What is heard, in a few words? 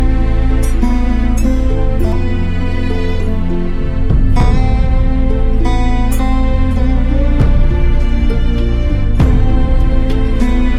BGM Ringtone; Gunjan Saxena